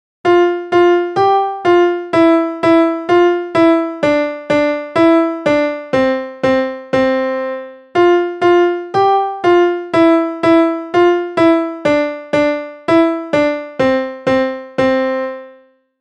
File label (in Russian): russkaya narodnaya pesnya zaigray-ka balalayka; ispolnyaet uchenik 4 b klassa cdo